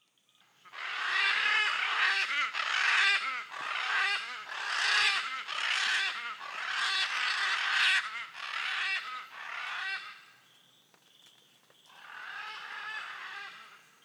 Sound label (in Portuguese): Som arara caninde